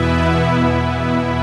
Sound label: BIG PAD, HOUSE